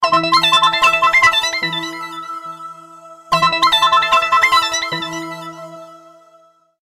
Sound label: ringing twice, ringtones